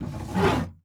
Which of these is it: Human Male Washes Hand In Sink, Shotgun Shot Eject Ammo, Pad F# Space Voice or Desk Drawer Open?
Desk Drawer Open